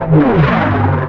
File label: airplane select; Pacificwar